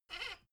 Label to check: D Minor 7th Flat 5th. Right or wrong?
Wrong